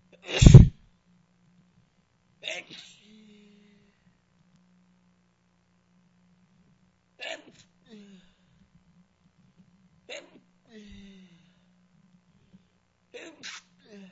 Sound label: sneezy, male